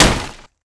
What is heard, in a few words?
hit wood